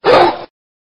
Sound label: dog barking; soundbible